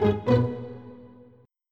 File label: Step